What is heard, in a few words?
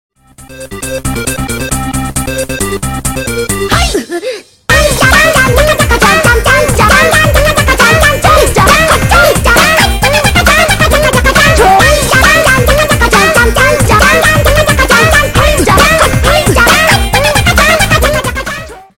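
anime ringtone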